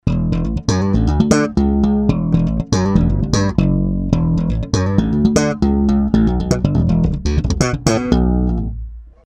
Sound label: chorus slap